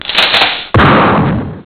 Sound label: gun shotgun cock shoot